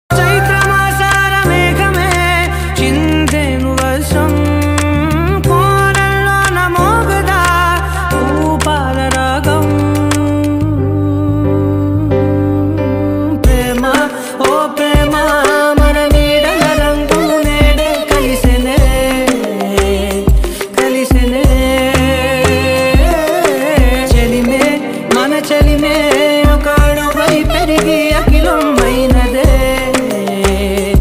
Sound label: love bgm ringtone; telugu ringtones